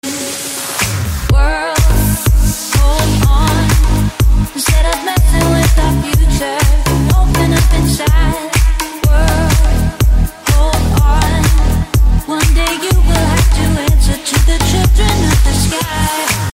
iphone 7 ringtone

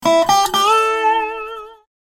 alert country slide ringtone

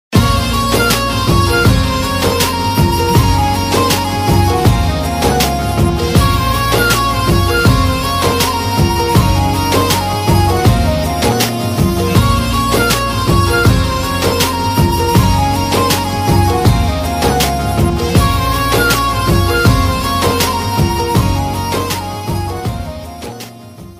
bollywood ringtone

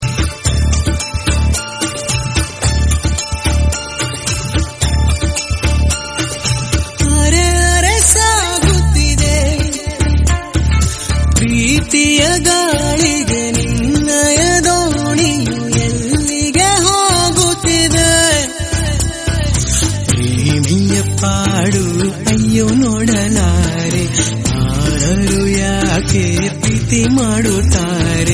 kannada tone ringtone

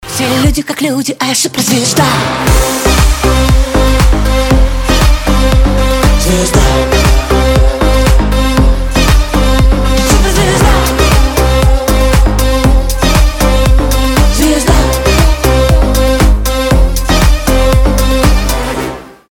russian ring tone

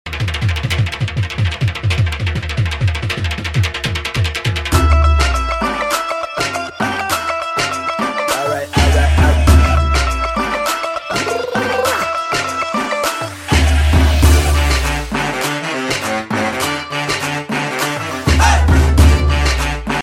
dhol music ringtone

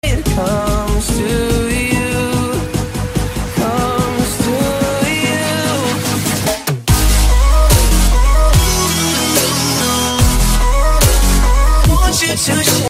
english ringtone

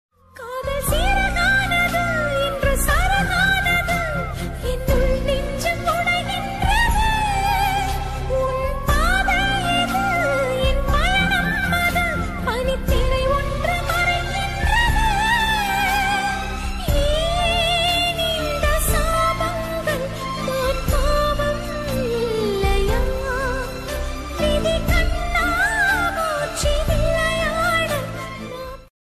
latest tamil ringtone

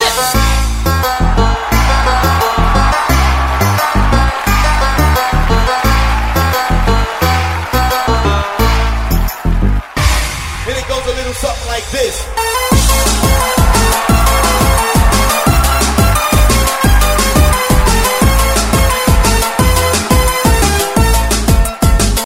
indonesian ringtone

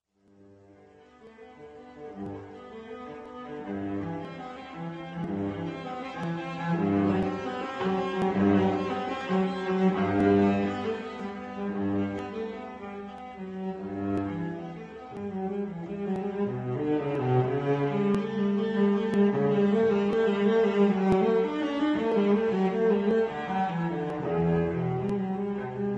cello suite ringtone

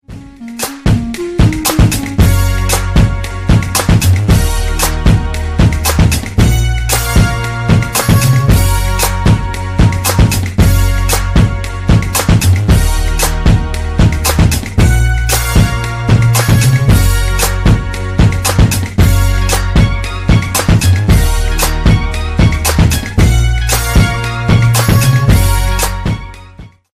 funky tone ringtone

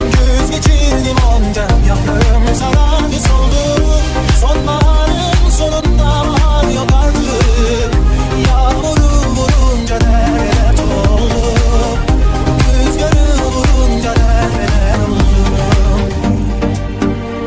tuerk rap melodisi ringtone

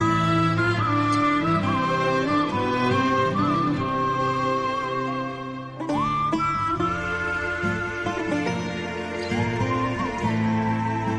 turkish ringtone

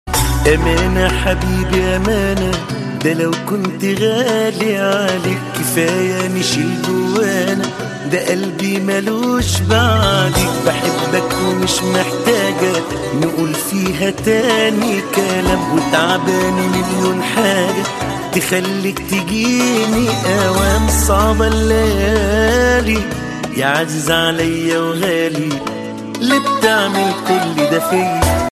arabic ringtone

